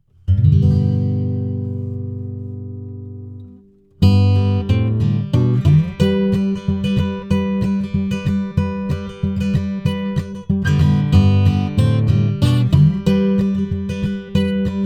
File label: Rode M5 AC Guitar only